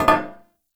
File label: Stone Cafe Table Impact; Warframe; Ambience; Nineteen Ninety Nine